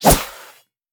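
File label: Whistler Attack Spear Through; We Are the Dwarves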